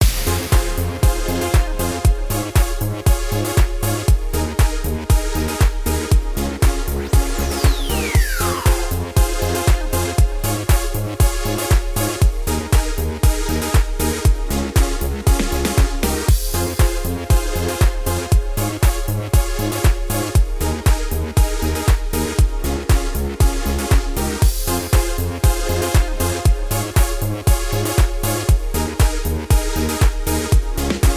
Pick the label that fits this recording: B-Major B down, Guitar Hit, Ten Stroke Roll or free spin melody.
free spin melody